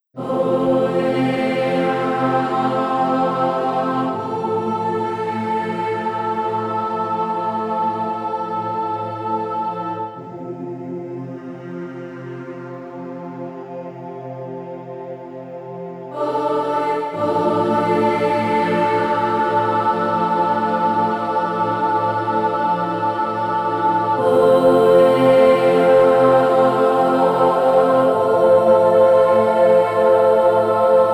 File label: choir